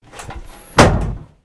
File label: Vehicle Hatches; Red Orchestra Ostfront 41-45